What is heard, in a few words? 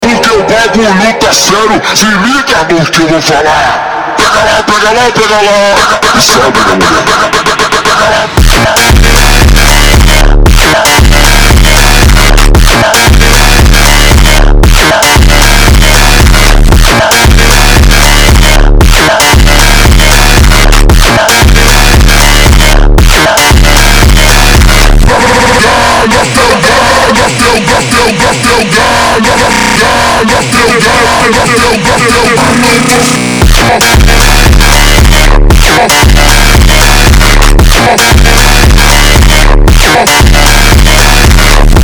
montagem slowed